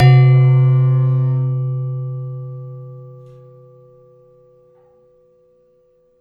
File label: Gender, Gamelan